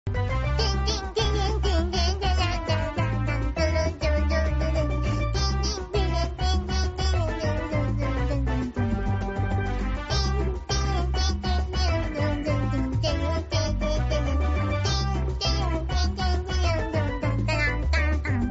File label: singing catchy; sakura miko